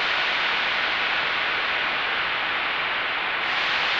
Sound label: sparse soundscape samples; ReLooped